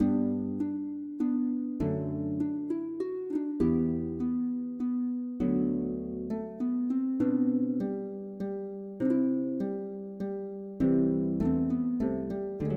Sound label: Menuet, MIDI